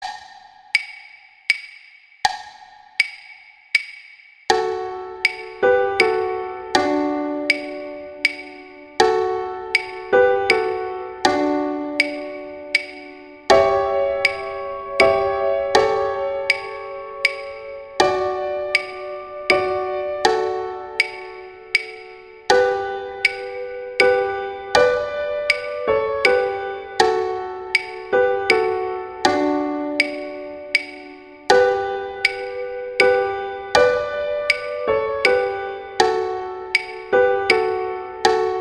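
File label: Silent Night accompaniment; Gruber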